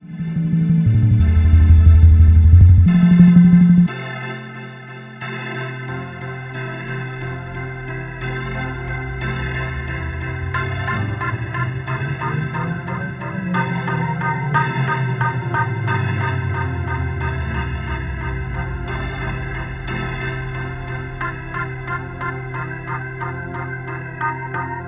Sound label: game Over Song